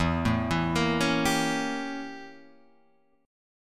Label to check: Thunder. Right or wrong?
Wrong